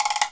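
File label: WOODBLOCK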